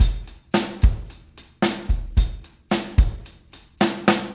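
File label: beat, Room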